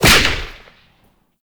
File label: Whip Crack Small; melee; weapons; Oraxia